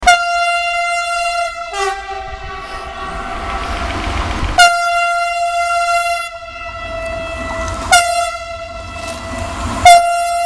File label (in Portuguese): Buzina; locs elec; Comboios